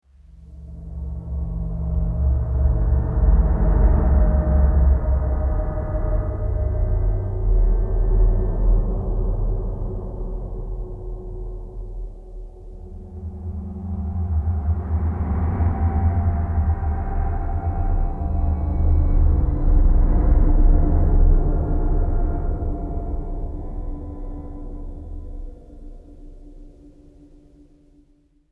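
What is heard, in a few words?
Second Floor Exploration; Apple Orchard; Condemned: Criminal Origins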